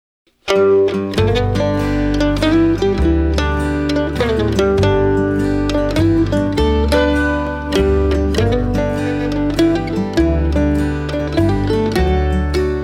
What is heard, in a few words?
Blue Waltz